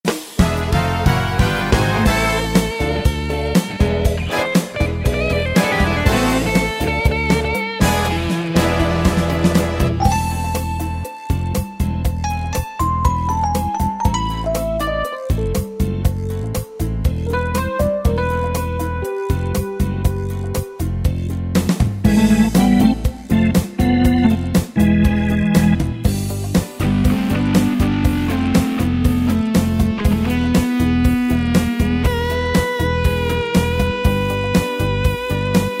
Rock Cha Cha, Latin; Factory Styles

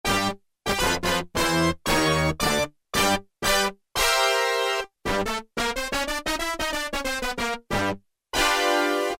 COPPER FUNK, Casio VZ-10M; Internal factory combi

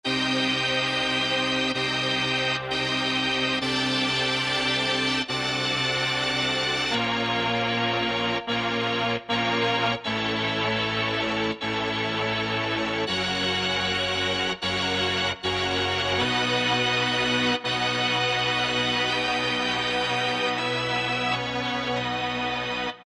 HiMelloStr, vintage keyboards

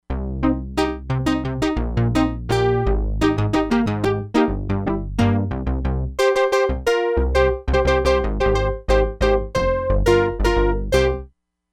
SAWTOOTH DECAY + SQUARE PIANO BASS, Crumar Bit 99; Factory Programs

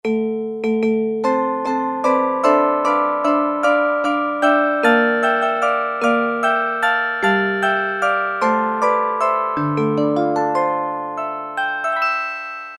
Glocken; E-mu Proteus-1

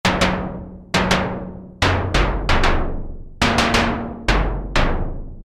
Tom Toms, Roland Juno-106; Factory Preset

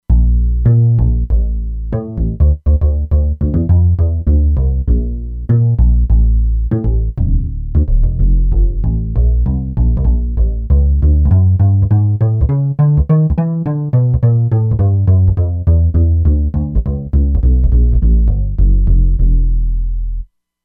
Amplify Bass, E-mu Proteus-2; Factory Presets

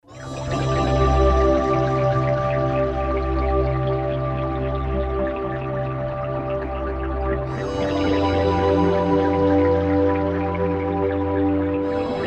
Water Pad, Korg MSC-16S; Korg MSC-16S Environment

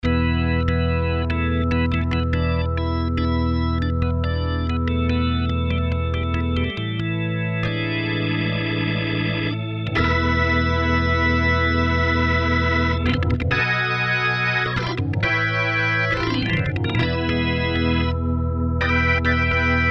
ORGANS Classic Rock Organ; Apple GarageBand 2

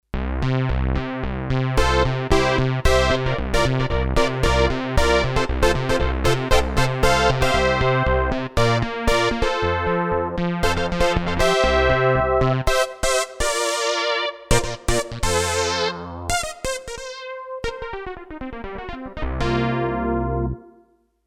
Polystab

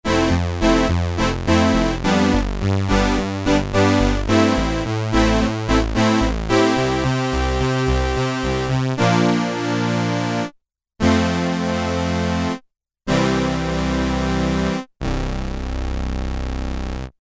Fat Synthe, Korg Poly-800

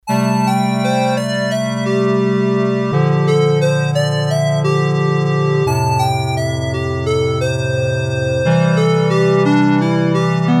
Korg DS-8 Sqr Wave Pd; Factory Patches

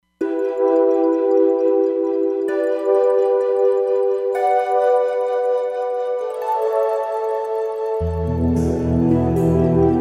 Angelbreeze, Roland Fantom XR

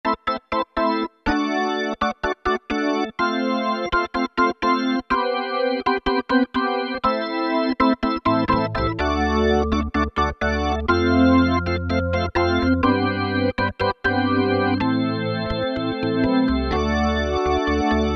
ORGANS Pop Organ; Apple GarageBand 2

